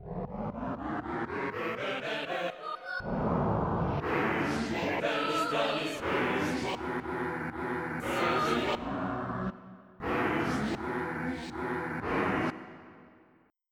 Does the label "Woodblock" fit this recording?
Yes